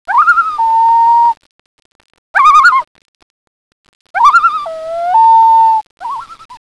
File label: loon